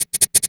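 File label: percussion, Drum & Bass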